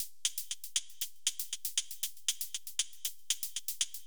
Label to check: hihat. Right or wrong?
Right